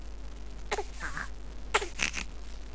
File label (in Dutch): snuffel